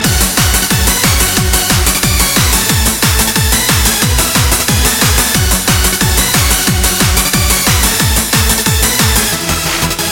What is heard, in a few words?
Hyper Techno